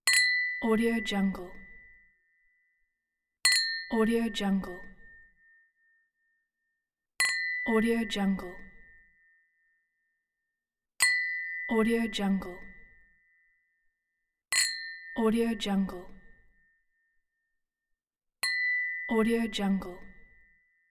glass clink; darksidemarket

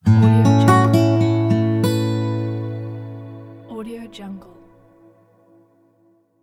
acoustic guitar; fx library

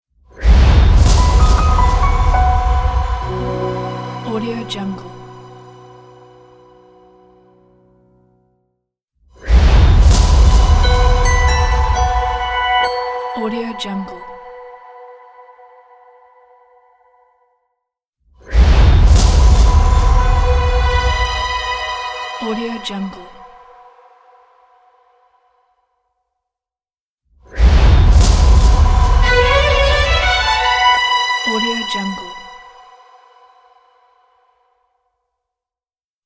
short logo

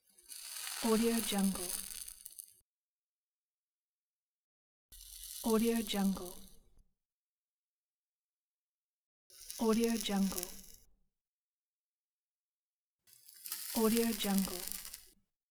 smoking a cigarette

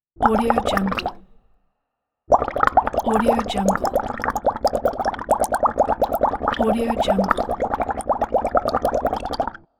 bubbles in glass

